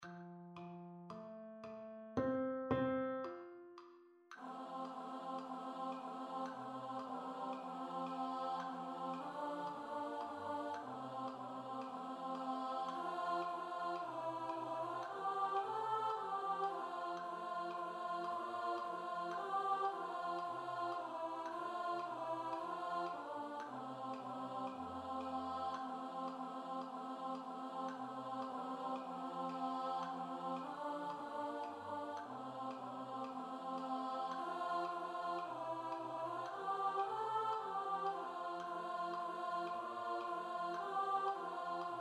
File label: ALTO STUDY